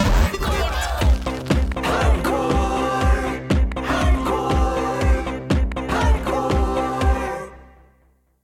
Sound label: Hardcore Mode Unlocked; populus run; android ios macos